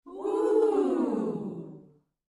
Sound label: impressed ooo from female studio audience